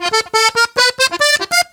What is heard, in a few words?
Accordion Lik F; Zero-G Kocktail Kollection; Accordion Lix